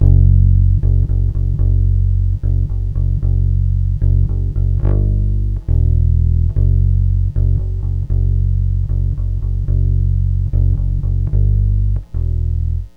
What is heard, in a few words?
Bass, F#; Zero-G Kocktail Kollection; Construction Kitz (Lounge); Volcano at Sunrise 074